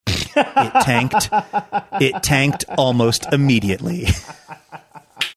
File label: snorting laughing saying it tanked it tanked almost immediately